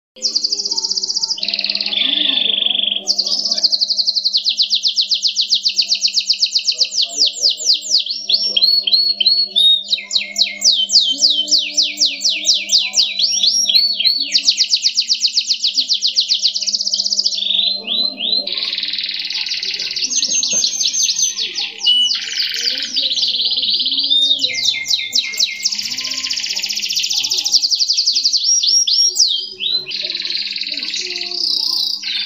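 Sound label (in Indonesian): kenari, canary; robin hood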